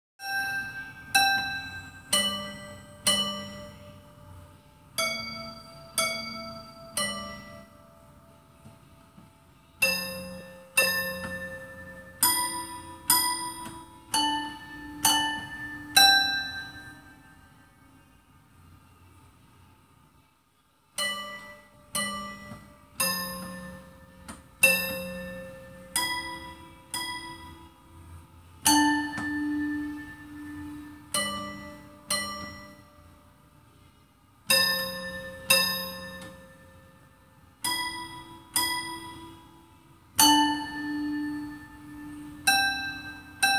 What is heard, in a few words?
Twinkle Twinkle Little Star How I Wonder What You Are Played on Piano